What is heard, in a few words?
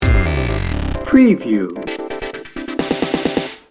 energy pulse sting bumper